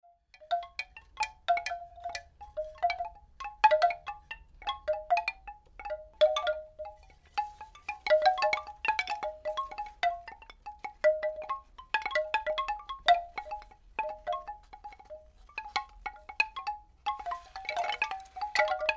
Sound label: Wind Chimes, Bamboo chimes; Weather; Tom Hutchings